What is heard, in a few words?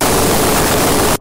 jumpscare; the doors 2 creatures; Seek